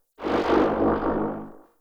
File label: Damage Upgrade Loop; Orbs; Upgrade Drops